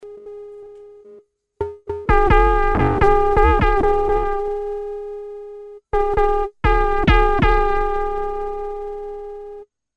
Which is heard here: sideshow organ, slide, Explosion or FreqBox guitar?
FreqBox guitar